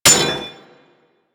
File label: Melee Axe Impact Generic; Warframe; Weapons; Axes